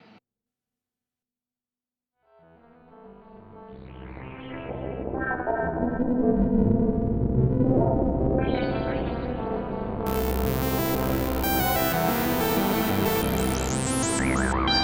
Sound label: Synthesizer Reverb Experimental EHX Oceans 12